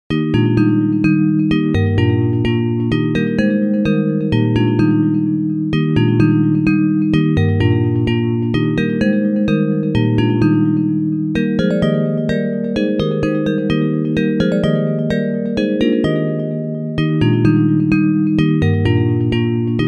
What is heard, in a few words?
Bells; Hymns